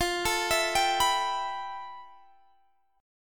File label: F Major Sharp 11th, Guitar, Half Step Down